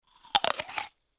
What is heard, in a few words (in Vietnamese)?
Tieng nguoi can thuc an